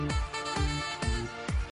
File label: Epic Notification